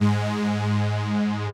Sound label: string